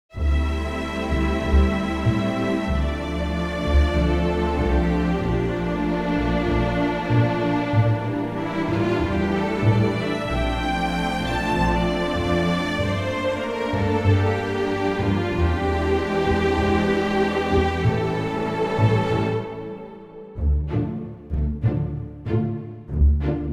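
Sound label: Dm orchestra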